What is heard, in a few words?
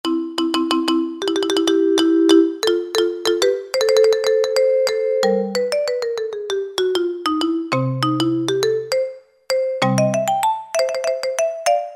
Brite Marim; Factory Preset examples